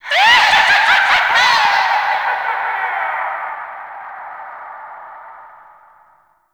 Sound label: Paint Pickup Laugh; Gameplay; Warframe; Shadowgrapher